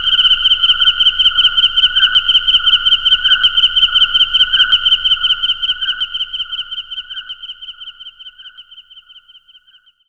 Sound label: Crickets; Zero-G Jungle Warfare 1, 2 & 3; Ambient